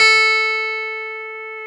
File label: PIA CLAVI A5, PIA Clavinet; Sound & Vision; Gigapack I CD 2